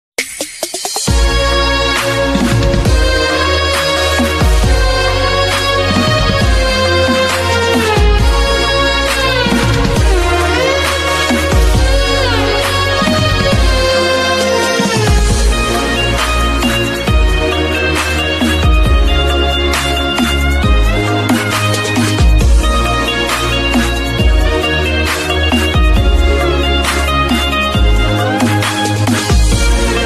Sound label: bgm